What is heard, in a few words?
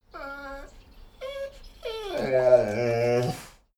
dogo argentino whine sequence